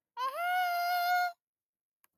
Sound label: hahaaa